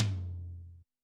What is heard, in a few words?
TOM, Yamaha SY85 Drums